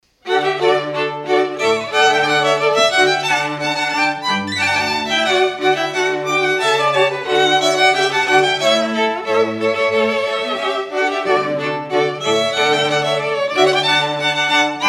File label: Rondeau, string quartet